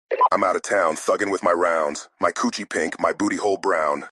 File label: rap song